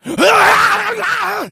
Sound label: become enraged, infected, male; left4dead; Source engine